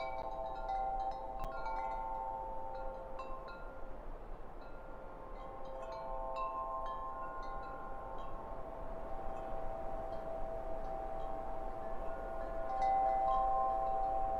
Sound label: windchimes; Minecraft; Votive Content